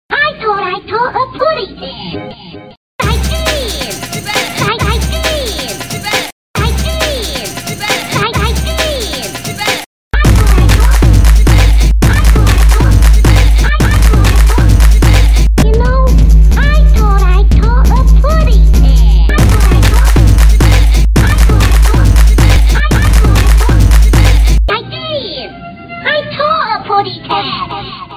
Bird Bass Mix; Tweety

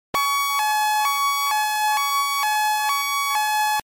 Ambulance

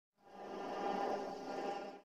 Breathing rise

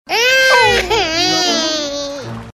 Baby Cry

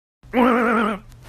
Waaaaaaaaaaah

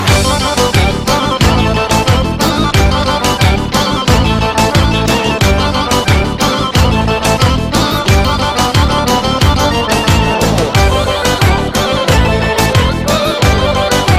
Balkan Turbo Folk

